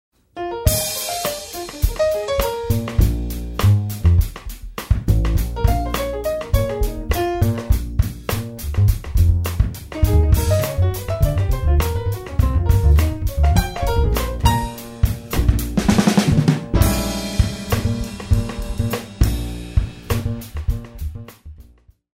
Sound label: Jazz Dance